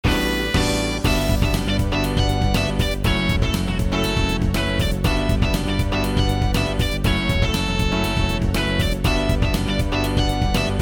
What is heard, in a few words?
midi